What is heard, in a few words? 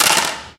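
physics clipboard impact small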